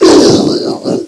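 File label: hurt, zombie; boomer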